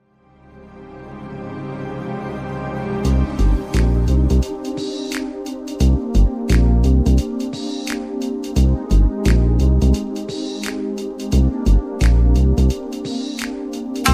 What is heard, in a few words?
Asian Lounge; putumayo